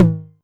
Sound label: Tom Hi, Novation Drumstation 909